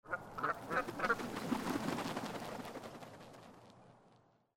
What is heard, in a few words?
geese flying